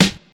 00s sparkly snare sound g key, snare single hit tuned to the G note